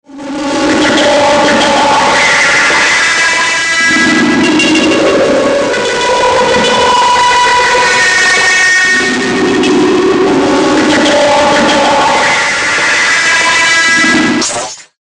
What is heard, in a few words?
Electrical Digital Distortion Harsh Feedback; Blastwave